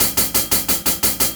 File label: Hat 175, Drum&Bass Perc Loops; EZRollers